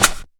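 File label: Swipe, percussion; Zero-G Jungle Warfare 1, 2 & 3; 90 s Sample CDs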